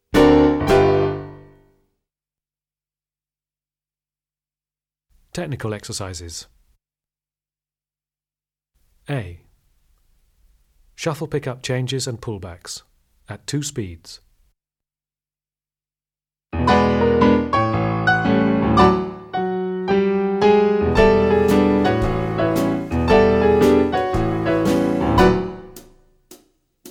Shuffle Pick up Changes and Pull Backs, tap; tap advanced 2

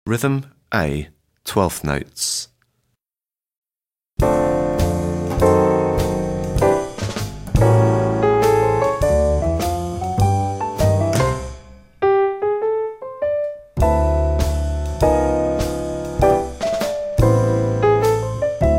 Rhythm, tap; tap grade 2; 1/12 Notes

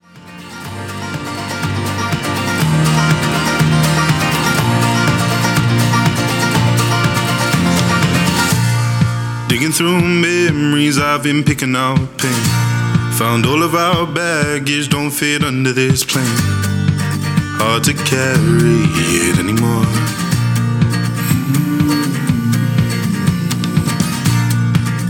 Jazz solo; advanced 2 istd

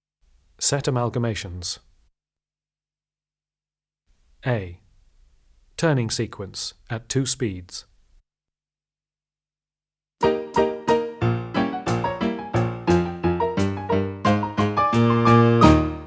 Turning Sequence at two speeds

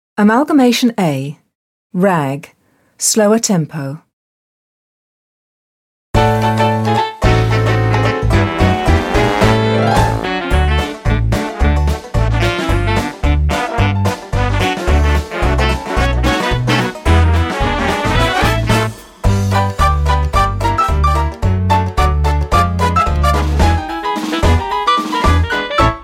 Rag slower tempo

